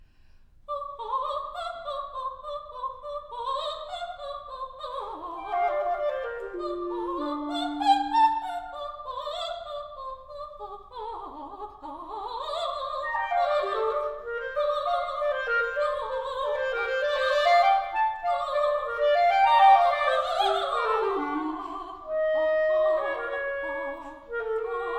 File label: vocalises scherzo; three vocalises